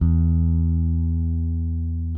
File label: Whole Notes E, Bass; Construction Kit; Bass X